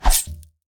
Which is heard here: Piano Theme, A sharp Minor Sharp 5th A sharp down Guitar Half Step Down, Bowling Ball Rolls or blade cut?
blade cut